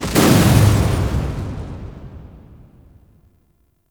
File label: Destruction Heavy Hits; Ambience; Warframe; Corpus Railjack